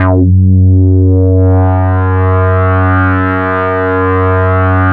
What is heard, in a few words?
DIVE, F#2, ARP 2600; Big Fish Audio; First Call Vintage Keyboards